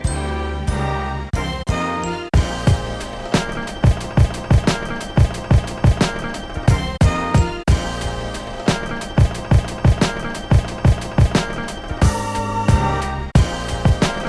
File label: beats, Maschine